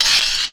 hihat open distorted

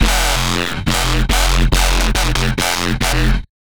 Fm 140BPM Melody

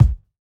Kick

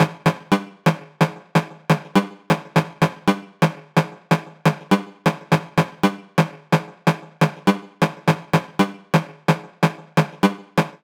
174 G- Synth

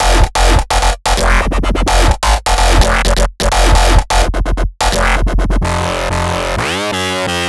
128 F Bass Loop

